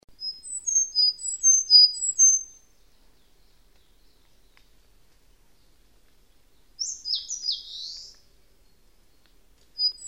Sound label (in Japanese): ezomusikui; bird